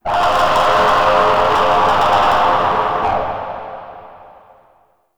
Resonating Didgeridoo; Ambience; Warframe; Shadowgrapher

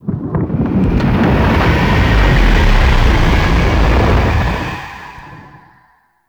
solar flare start; Warframe; The New War

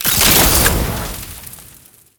Alchemy Grenade Pickup Fire; Entrati

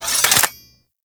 Pole Arm Collapse

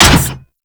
Jackal Step Metal; Warframe; Machines; Steps

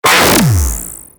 Charge Gun Shot; Warframe; Corpus

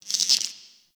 Insect; Liset Alchemy Lab

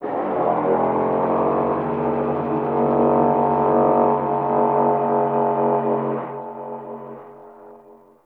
Venus Landscape Distant Horn, Ambience; Warframe; Venus Open World

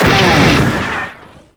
Fire Shot Loop Start; weapons; Lizzie; Warframe

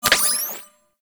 Puzzle Symbol Switch UI; Venus; Kahl; Warframe; Veilbreaker

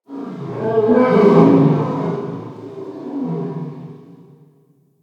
Amb Corrupted Animal Distant; Ambience; Mendicant Area

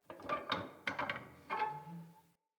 Tent Fire Pot Metal Creak; Soul Plane

